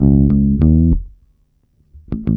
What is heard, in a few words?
bass, Roots Funk; USB Soundscan vol.41; AKAI